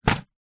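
household medicine nicotine gum card box set down table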